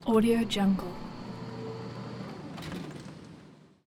airport electric door noisey airport terminal; urban sounds

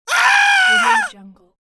male short terror scream

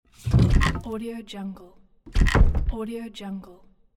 old wooden cabinet; game

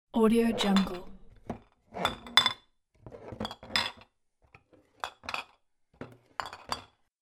moving jars in pantry; domestic sounds

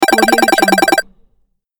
retro ringtone; interface sounds; زنگ سیستم عامل

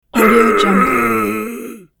pain vocal male one shot long painful moan; druid; ناله دردناک مرد تیرخورده

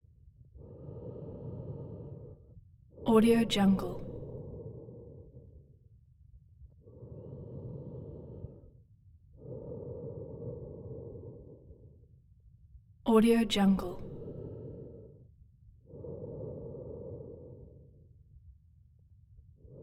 female breathing; human sounds

